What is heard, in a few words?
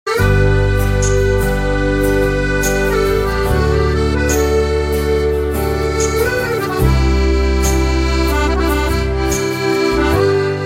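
violin cover ringtone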